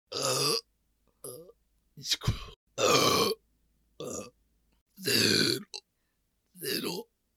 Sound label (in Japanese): ゲップのみ